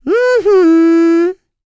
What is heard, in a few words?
LICKS, Vocal Planet, R&B; ILIO; Dance; LT R+B